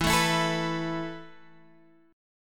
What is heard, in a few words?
E Suspended 4th E down Guitar Drop D